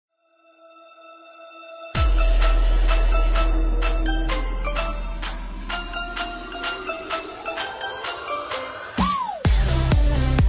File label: Jingle Bells; pixelworlds